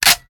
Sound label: ar reload empty bolt close; weapons; gmod; viper